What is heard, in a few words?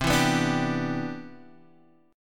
C Major 9th, Guitar DADGAD